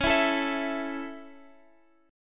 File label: Db Minor Sharp 5th Db; strummed